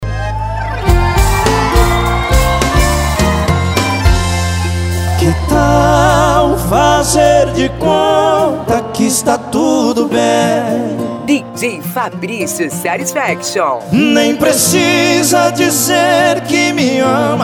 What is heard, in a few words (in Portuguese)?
sertanejo; saveiro evolution do anderson mendes